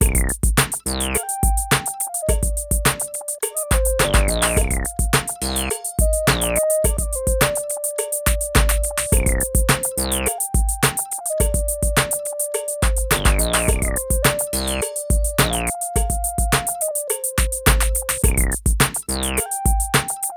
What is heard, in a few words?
BEAT, Beats 105-150bpm